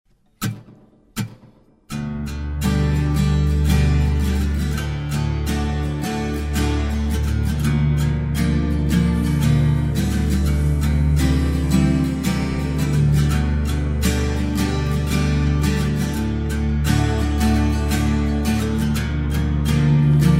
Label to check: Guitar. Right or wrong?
Right